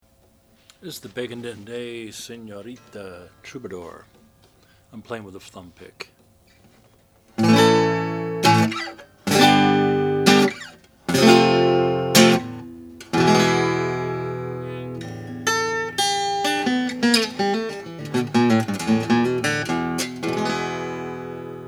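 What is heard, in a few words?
bacon day regal senorita troubadour, Guitars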